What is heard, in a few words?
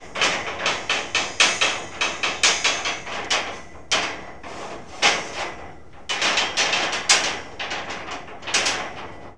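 metal pipe clanging; Call of Duty Modern Warfare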